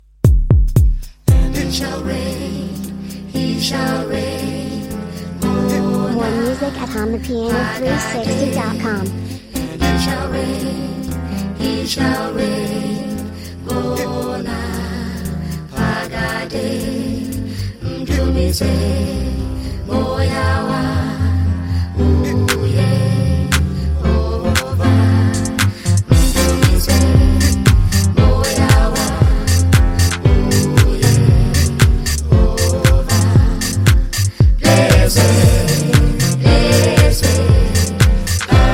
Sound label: kwaito